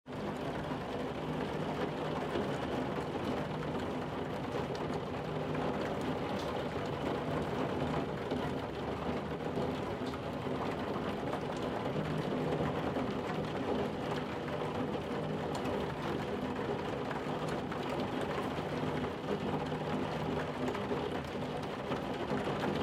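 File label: pleasant calm on the window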